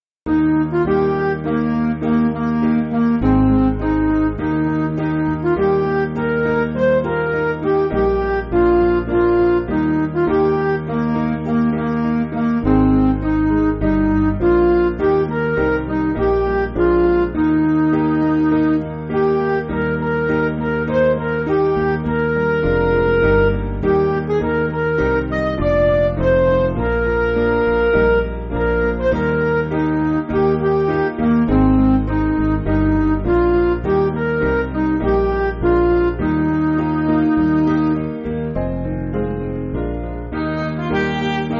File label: Midi; Therell Be No Dark Valley; Sankey